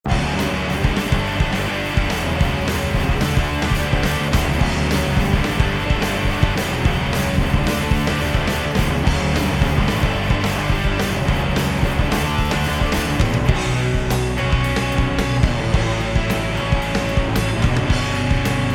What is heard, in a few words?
Instrumental